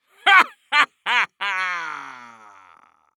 Human Laugh Evil Rich Jerk

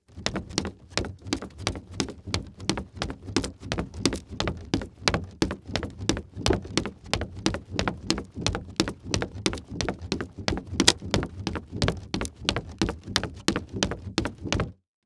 Foley Footstep Hard Sole Jog On Wood; Foley Footsteps

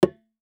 Impact Tire Rubber Hit By Solid Metal Pole

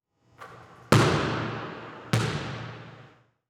Sports Basketball Shoot

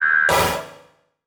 Flash Blast; Multimedia Internet CD-Rom Flash

